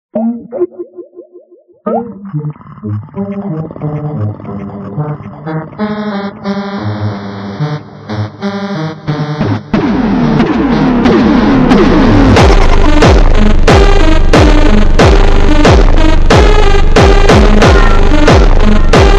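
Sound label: jumpstyle extreme Slowed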